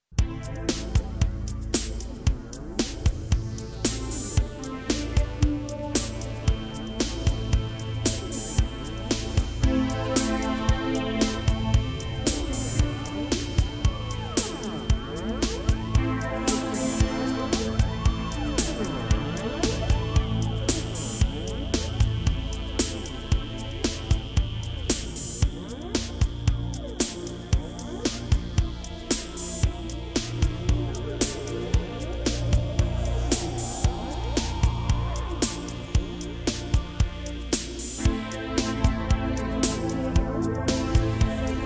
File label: Instrumental; Songs-for-Ukraine